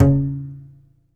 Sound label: C. Bass Balalaika, Plucked Strings